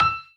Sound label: piano melo